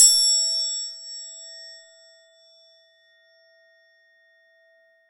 TEMP E6, temple bells; SoundBytes - Early Patches

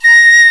PICCOLO A#2; E-MU Formula 4000 Series Vol. 5; Protozoa; Proteus2 Instrmt

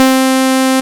ACID C4

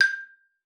G5, xylophone; Xsample VOL-4

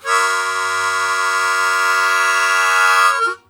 Blues Chord Attack F#1, Harmonica; Zero G - Harmonica

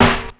Snare, Ensoniq ASR-X Pro

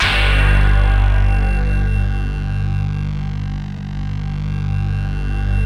MIDI STACK, ENSONIQ VFX; Rubber Chicken Software Sample Bank

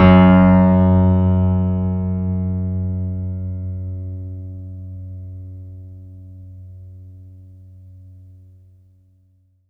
F#2, Synth Line

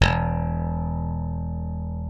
E1, slap thumb; Xsample VOL-1

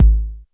K909, KICKS TR 9+8

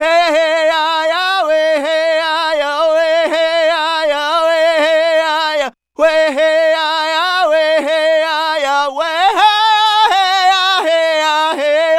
Native Vocals, Native American; Continental Drift Aftershocks

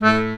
ACCORD3 A1, Accordions; USB Soundscan vol.40 - Complete Accordions; AKAI

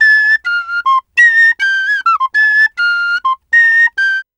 Penny 103bpm F, Penny Whistle

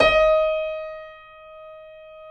KEY EB4, YC7 Piano mf; Roland - Rhythm Section

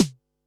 snaredrum, Pearl SC-40

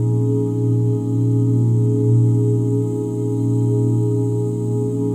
OOH A#SUS13; ILIO; Vocal Planet; Jazz & FX

